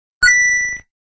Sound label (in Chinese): 金币; 疯狂金币